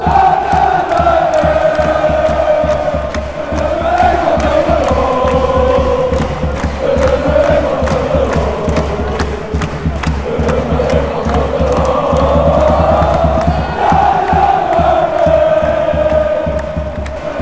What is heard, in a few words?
chant, fan chants; vitesse